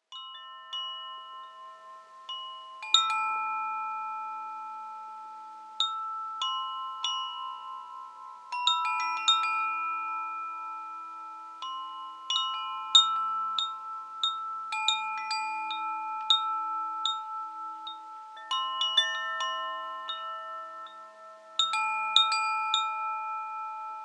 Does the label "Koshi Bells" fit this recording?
Yes